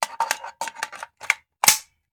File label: Machine Gun Foley; Sound Ideas; Guns